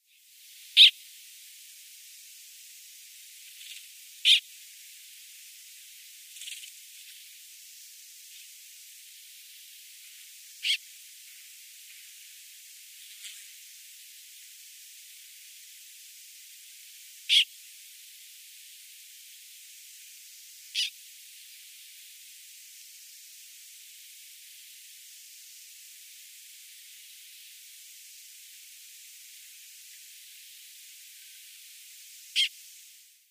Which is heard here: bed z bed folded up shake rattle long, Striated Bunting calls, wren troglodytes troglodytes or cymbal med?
Striated Bunting calls